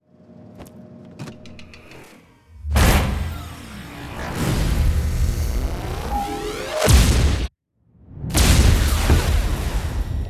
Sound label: Boom; starbites; Makobo